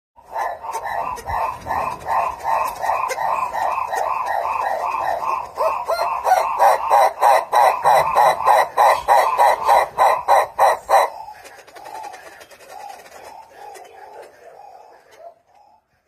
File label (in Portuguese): Canto do turaco de livingstone